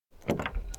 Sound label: door loghouse open slow; anemoi